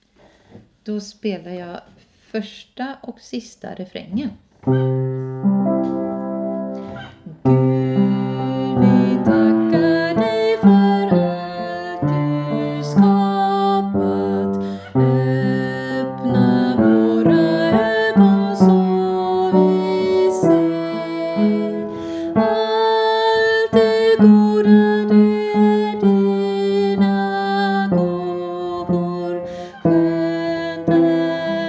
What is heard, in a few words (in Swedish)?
bas; varvinter 2025